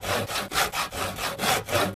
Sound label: Saw; Project Zomboid